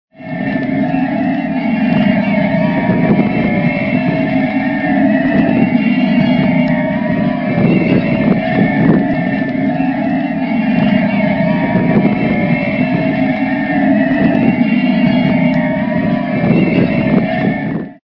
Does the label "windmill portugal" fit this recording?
Yes